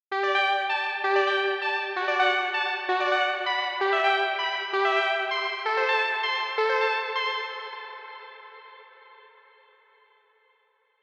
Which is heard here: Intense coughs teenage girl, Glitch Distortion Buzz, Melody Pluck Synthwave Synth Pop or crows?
Melody Pluck Synthwave Synth Pop